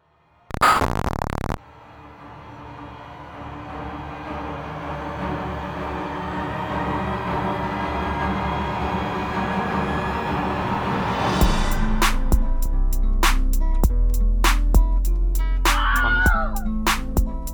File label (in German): schoenes lied spooky